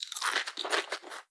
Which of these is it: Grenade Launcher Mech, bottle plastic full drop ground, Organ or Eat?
Eat